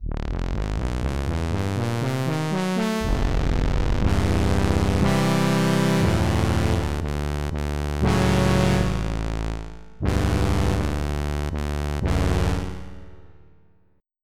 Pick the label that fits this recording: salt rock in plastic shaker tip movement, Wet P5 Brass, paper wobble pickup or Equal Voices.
Wet P5 Brass